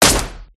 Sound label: WEAPONS SHOT